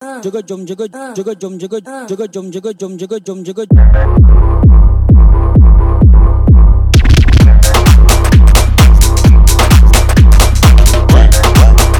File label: shopping funk